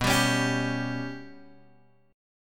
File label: B Suspended 2nd Sharp 5th down Guitar DADGAD